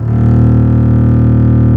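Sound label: double bass D#1; AKAI S-Series CD-ROM Sound Library VOL-8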